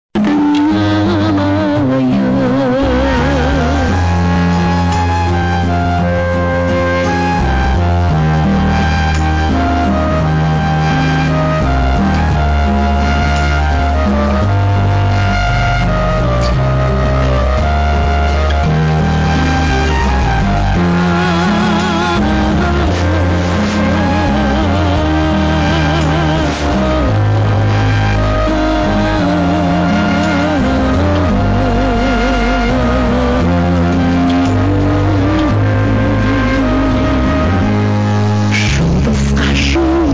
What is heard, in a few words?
85 110 MHz